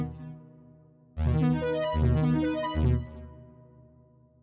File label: Saw What Arp